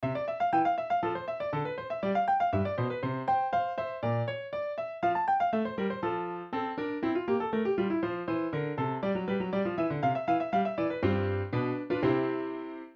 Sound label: piano